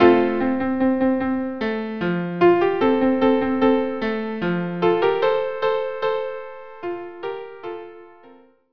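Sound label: violin viola duo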